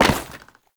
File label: Step Wood, Foley; Warframe; Oraxia